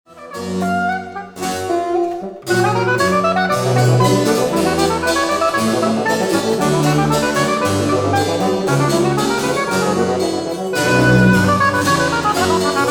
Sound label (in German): Trio in c moll